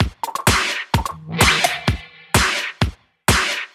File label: House loops 128BPM; Vengeance Samples